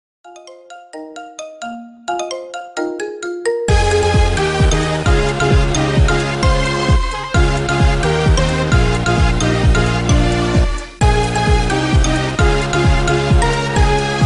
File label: marimba ringtone remix